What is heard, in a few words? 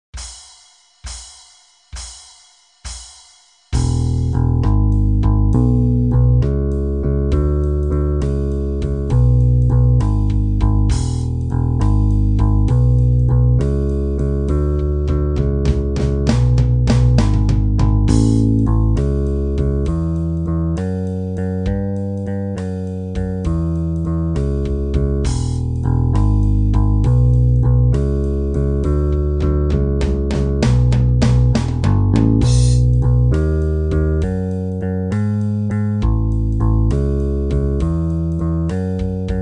Blues Shuffle Drums Bass